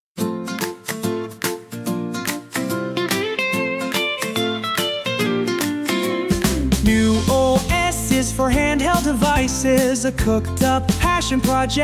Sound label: Jingle; MUOS; Xargon